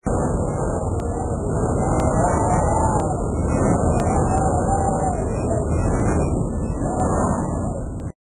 windy; egypt

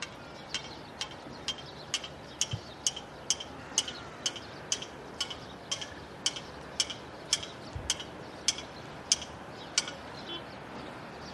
hammers; egypt

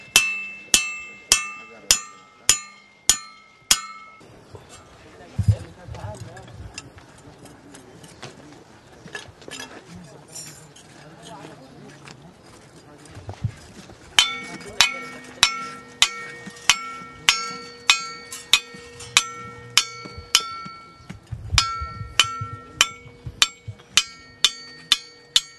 hammers near; egypt

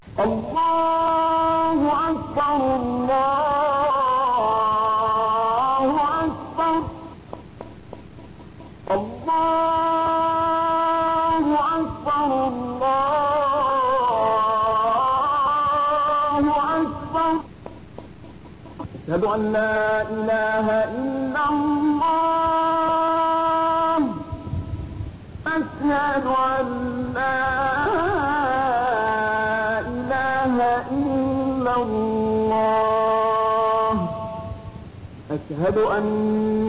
azan; egypt; egypt narrative

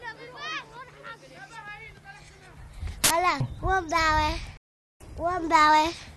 kids; egypt

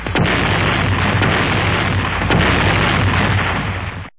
expl; egypt; egypt narrative

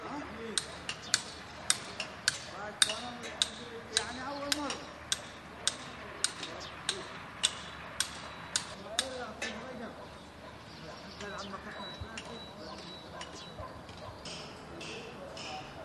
hammers voices dogs far; egypt

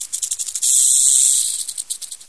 rattler; egypt

